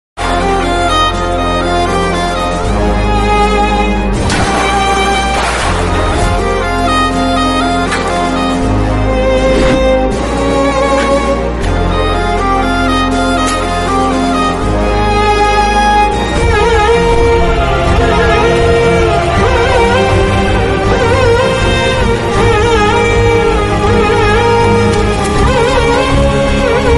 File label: heart touching bgm love bgm; krishnarjuna yuddam